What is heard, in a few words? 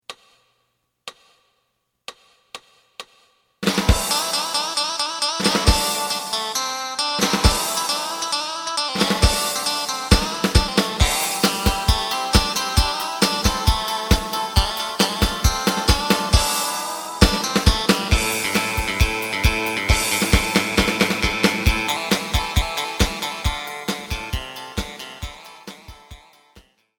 bass